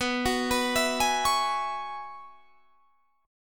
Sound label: Gb 9th Suspended 4th B, chord